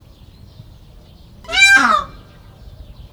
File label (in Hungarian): verebek is, páva; debreceni zoo 2012; marantz puska